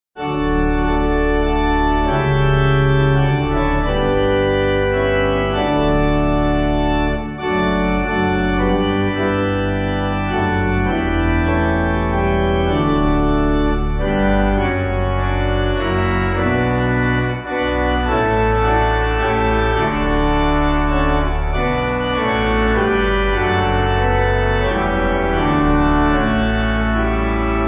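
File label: Pipe Organ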